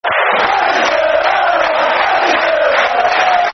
Football Chants (Soccer Songs); Manchester La La La; Man City Mcfc

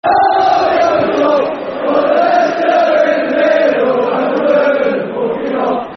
Build A Bonfire Football Chant; Nottingham Forest Nffc

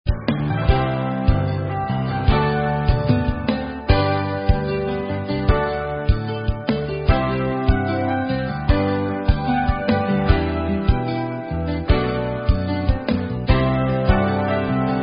Instrumental; Girl Scouts

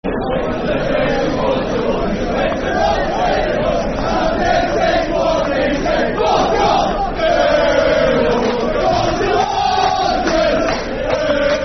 Bolton Went To Rome Football Chant; Bury; Bury Bfc

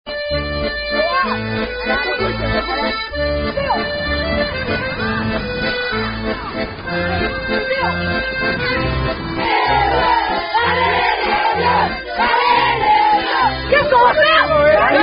Spanish Rugby Chant And Song; Allez, Venez

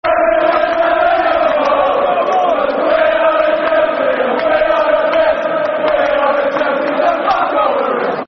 Fuck 'em All, Football Chants (Soccer Song); Chelsea Cfc

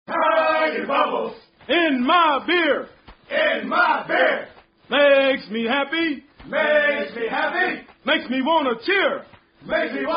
running cadences; US navy; cadencemasters

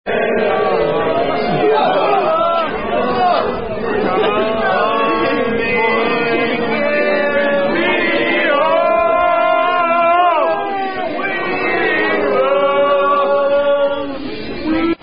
Circle Singing, Swing Low Sweet Chariot

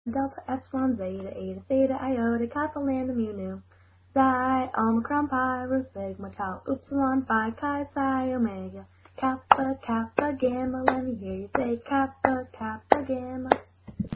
Greek Alphabet Song; Kappa Kappa Gamma Version

